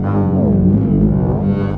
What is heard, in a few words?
bendy wobble tone; Electro and Synthetic